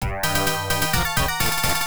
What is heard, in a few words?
Miss; Ginga Ninkyouden; arcade